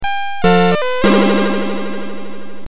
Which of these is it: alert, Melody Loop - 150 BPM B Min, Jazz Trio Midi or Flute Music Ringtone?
alert